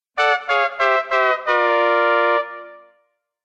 fail

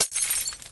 icebreak; monstersunion

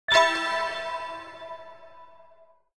lose

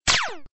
fire Effect

